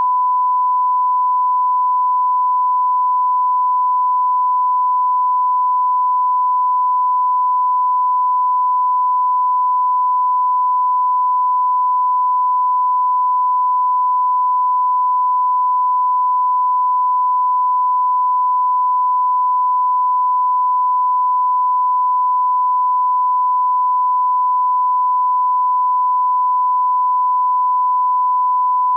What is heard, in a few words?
sinus 1kHz